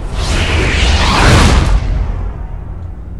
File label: woosh and bang